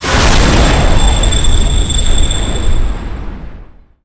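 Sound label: explosion sonic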